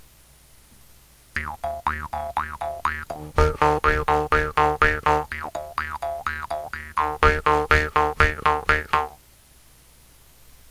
jaw harp random

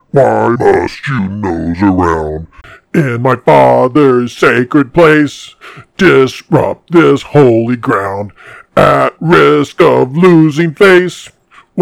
hack vocals

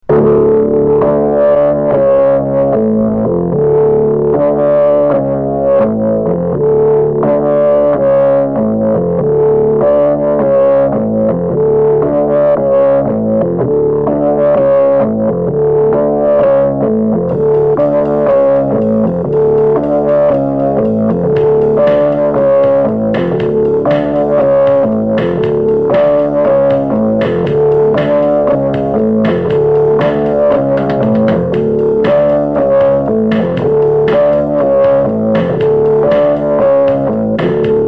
organ grinder

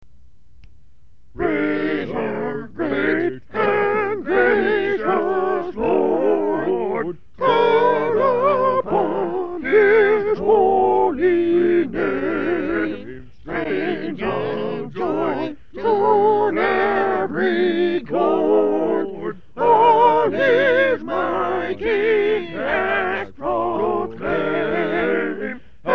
acapella

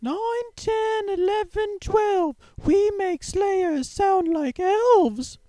falsetto

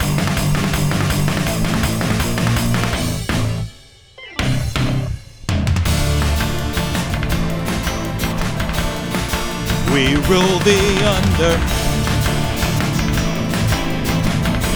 Chorus Scratch Vocs

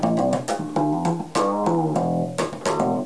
slap

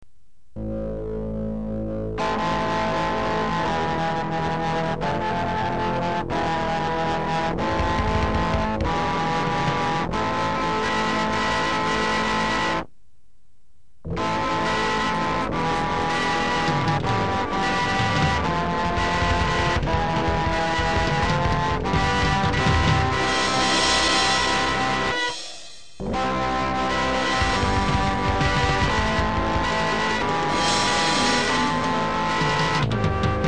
Organ Grinder

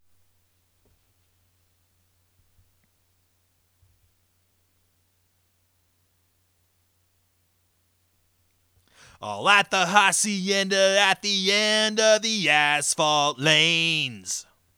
vocal

